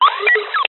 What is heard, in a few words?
cb radio static interference burst; technology